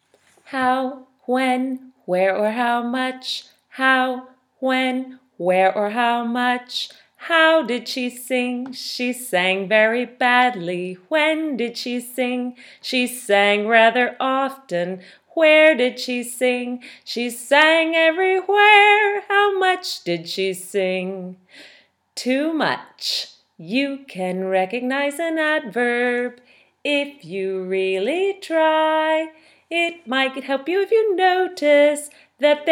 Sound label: Adverb Song